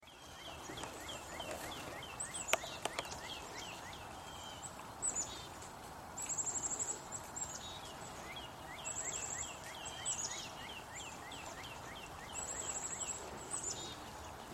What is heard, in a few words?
birdsong after snowfall